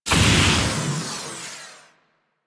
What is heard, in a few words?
Corpus Gas City Rod Electric Discharge; Ambience; Warframe; Gameplay Remaster